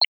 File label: code puzzle number push